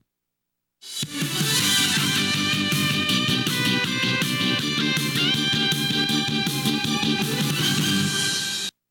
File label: Victory; RideGear Guybrave II; PS1